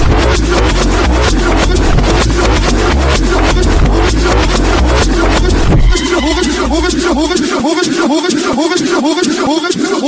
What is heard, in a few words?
reverse music